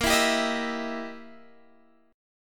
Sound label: E Minor Major 7th Flat 5th A sharp